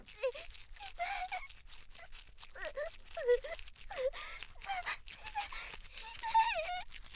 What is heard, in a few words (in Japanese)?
痛い